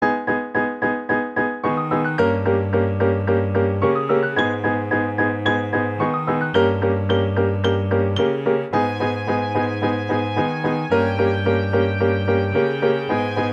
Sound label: Practice Tempo E flat